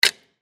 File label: Go Kart Gas Pedal, Go Kart Gas Pedal Release Electric; Go-Carts; Vehicles; SoundEffects-Blastwave; Sports